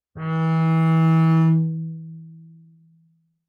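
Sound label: Bass arco fortissimo sul G E3, Double Bass; MIS Pitches - 2014; Strings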